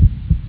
heartbeat, instruments; sonix; Rise Of The Dragon; chipmusic

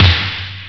Snare instrument; sonix; chipmusic

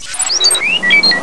R2D2; chipmusic; Instruments; sonix; Empire

